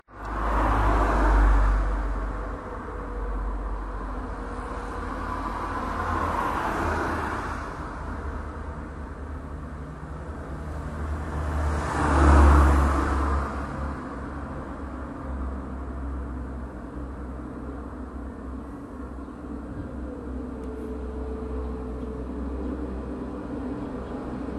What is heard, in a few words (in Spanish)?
Trafico Tenderina Baja; oscardeavila